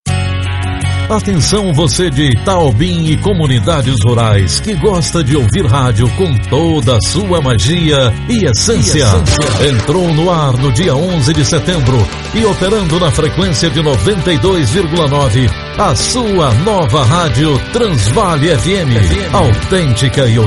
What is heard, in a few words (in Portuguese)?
vinheta